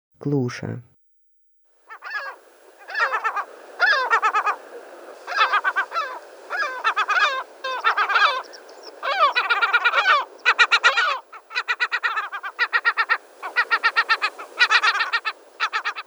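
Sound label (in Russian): klusha golos, lesser black-backed gull voice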